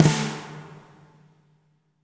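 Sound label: reverb snare right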